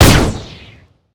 bullet bolter; ufoai; weapons

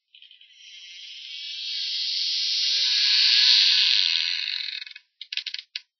creaky door open

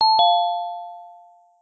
notify down

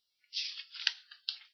book

